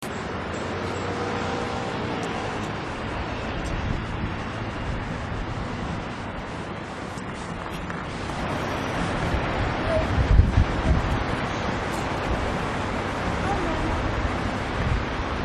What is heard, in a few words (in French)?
circulation sur les quais